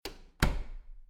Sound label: door close; Golden Crayon Sounds